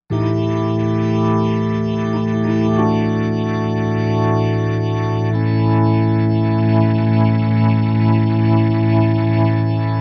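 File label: organ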